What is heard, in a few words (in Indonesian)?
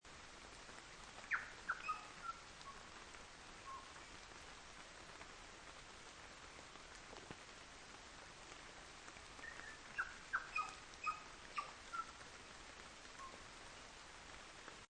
suara alam